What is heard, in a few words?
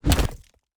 ui construction plan place